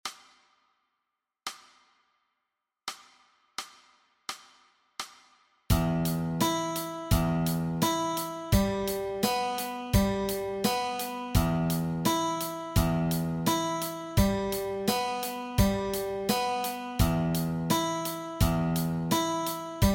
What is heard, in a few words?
Guitar Exercise